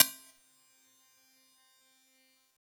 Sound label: graze; paratate